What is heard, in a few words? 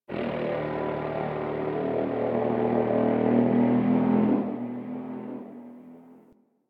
Landscape Distant Horn, Ambience; Warframe; Venus Open World; One Offs